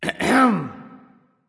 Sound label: clearthroat; erlangshen